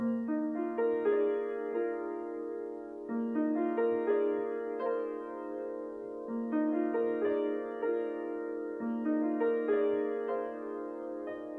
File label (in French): air de piano; polaris